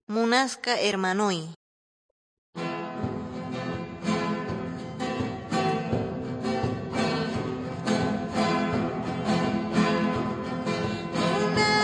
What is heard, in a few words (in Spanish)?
songs quechua norte de bolivia apolo